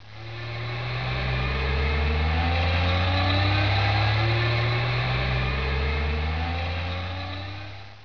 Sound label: TRUCK